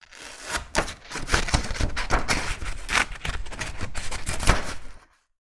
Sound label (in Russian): nozhnici razrezayut tolstuyu bumagu